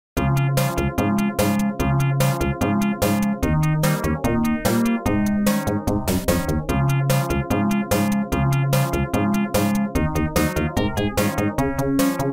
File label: stage music